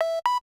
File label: Movie Shot start; LG SV730; camera